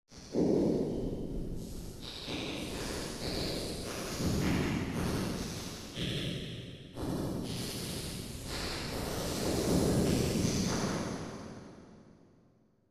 Large Hall; reverbs; MPX1

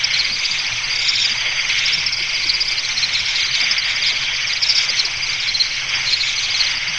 swamp

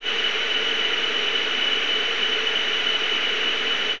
birds

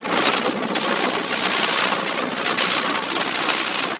rain

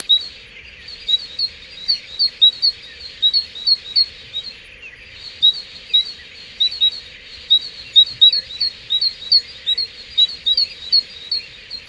birds

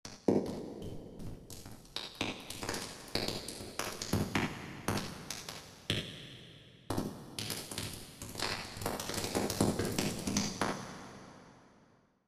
Large Concert; reverbs; waves trueverb

